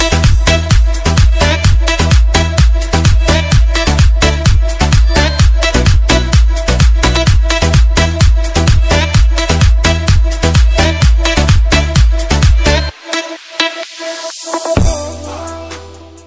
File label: Arabic ringtone